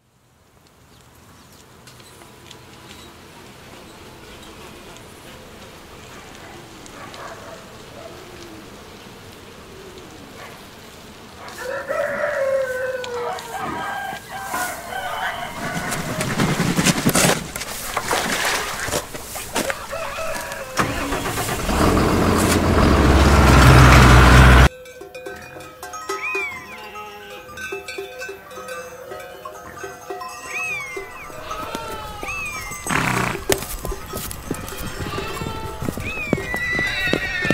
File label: farm; central-continent